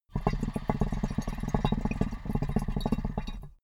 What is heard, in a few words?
water pipe puff bubble long